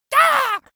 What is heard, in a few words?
monster assault high; schreie